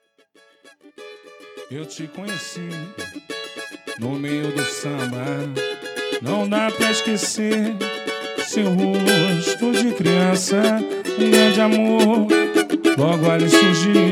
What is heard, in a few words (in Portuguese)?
musica